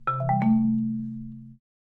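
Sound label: Marimba Accent Short; mallets marimba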